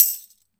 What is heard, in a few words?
DEFI CLOSE, Mediteranean Traditions percs